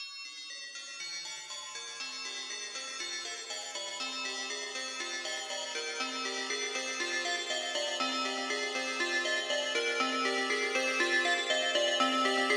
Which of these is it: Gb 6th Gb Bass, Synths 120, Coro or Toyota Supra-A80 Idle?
Synths 120